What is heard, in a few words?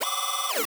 Cool Lazer